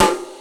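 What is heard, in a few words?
GATE SNARE; Crypton - DrumBase5000; GATE KITS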